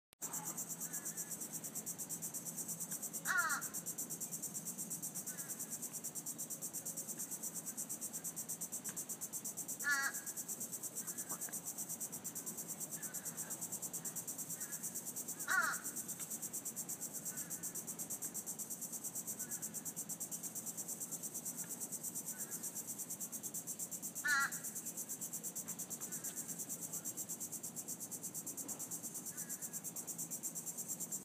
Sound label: Listen to a Fish Crow letting us know